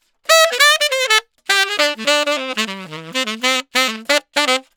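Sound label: 100 Acid Jazz sax A major; Brass; Digidesign; Pro Tools; Pro Tools Essential Loops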